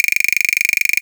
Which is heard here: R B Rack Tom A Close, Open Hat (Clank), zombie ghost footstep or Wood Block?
Wood Block